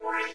windows 98 restore up; windows 9x; sound schemes

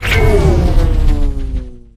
laser node power off; Source Engine; Portal 2

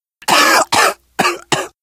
cough, survivor voice

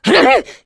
pain short, voice; video game; source engine; left 4 dead 2; jockey

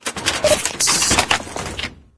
regenerate; Source Engine; tf

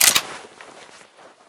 hunting rifle bolt back; Left 4 Dead; Source Engine

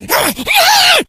rage, infected, male

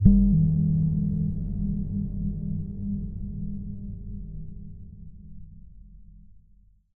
editor blips; Source Engine; Portal 2

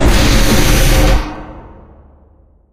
rat maze down travel; Source Engine; Portal 2

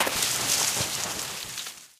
foliage, footsteps, survivor, run; player; source engine; left 4 dead 2

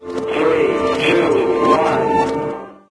the 60's usa startup; windows 9x; sound schemes

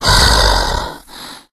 hulk breathe; Left 4 Dead; Source Engine; tank

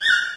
nature default sound; windows 9x; sound schemes

